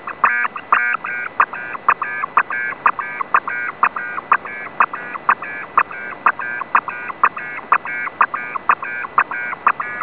Rohde & Schwarz 240.81Bd / 720 bps 8-FSK ARQ system; Rohde & Schwarz 8-tone ARQ in IRS-mode